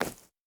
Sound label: stag footstep grass hard; Rust